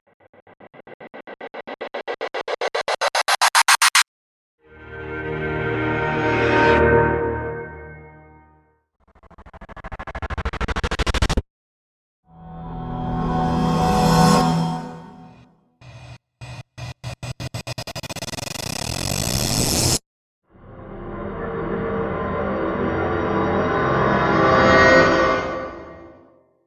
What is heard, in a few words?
Rise; KontaktPlayer